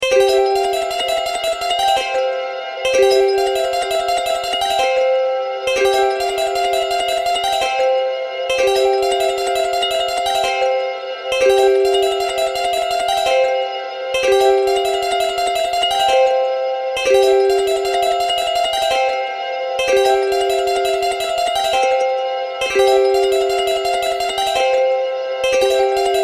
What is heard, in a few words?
ringtone solo; Версия соло